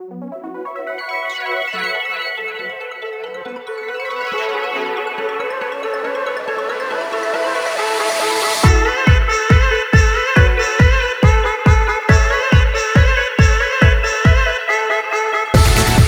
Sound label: Music Shooter; sayonara wild hearts 2019 gamerip